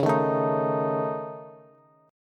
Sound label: C sharp Suspended 2nd Sharp 5th C sharp Bass; C#sus2#5; strummed; JGuitar